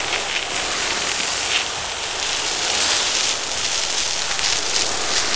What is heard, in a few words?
roll sand; terrains; Tony Hawk's Project 8; XBOX